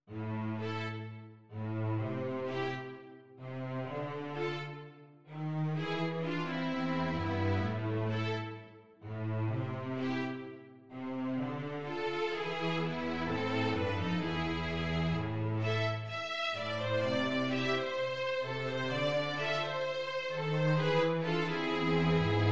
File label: String Quartet